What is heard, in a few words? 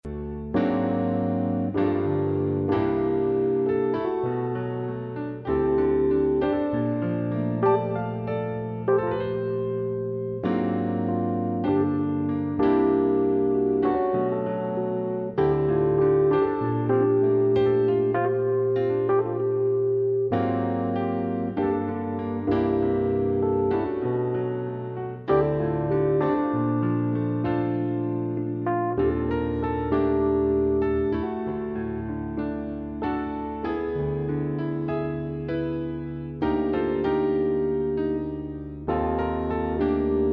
instrumental outro